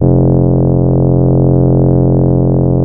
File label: DIDG; CMP - Analogon